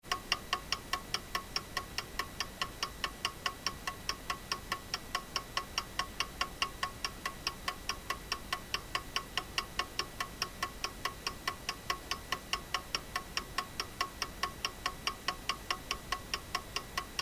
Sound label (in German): Pendelwecker